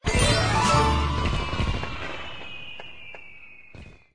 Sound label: victory